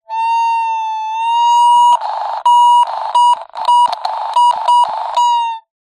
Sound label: technology walkie talkie radio interference feedback